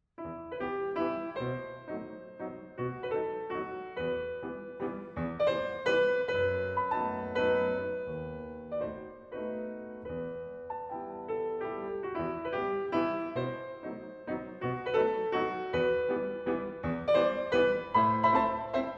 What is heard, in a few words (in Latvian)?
Mazurka e moll